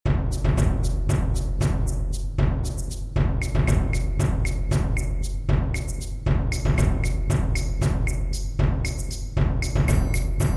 ambiance pour quatuor guitare percussions

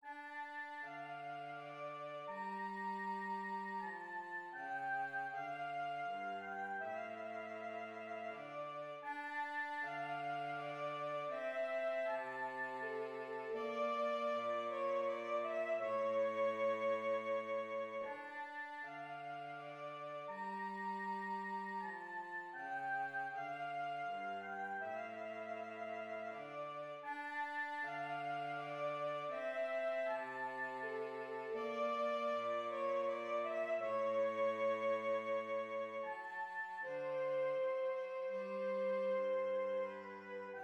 sarabande mineur

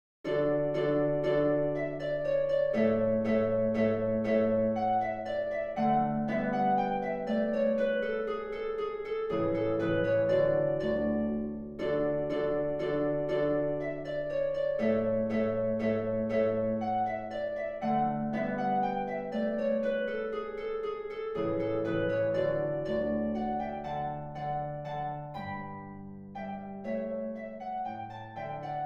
danse allemande, ré majeur; haydn joseph

